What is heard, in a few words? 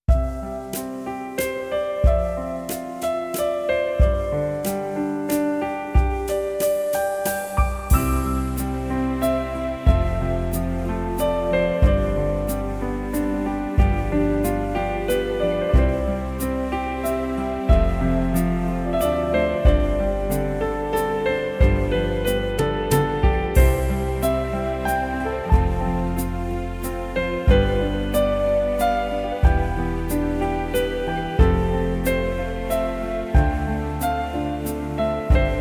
waltz